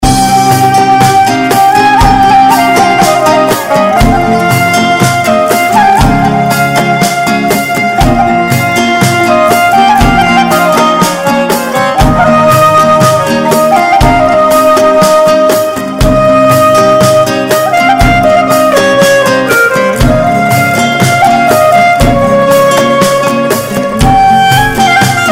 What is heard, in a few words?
violon